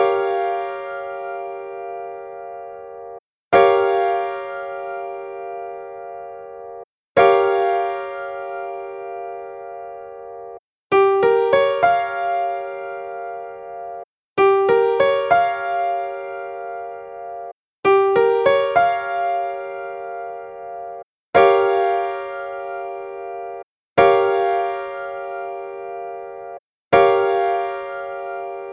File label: Gm7 Piano Chord